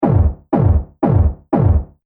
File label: 120 Bpm Loop Mutant Kick Machine; Percussive And Rhythmic; Seamless loop; Drum Loops; Andrew Fleming